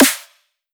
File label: snare